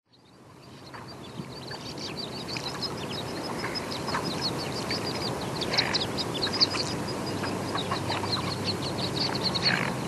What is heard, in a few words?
tufted duck